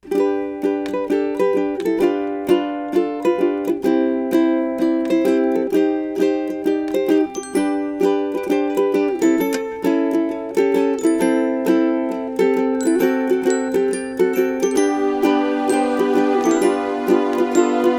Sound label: Oldtime Song, ukulele songs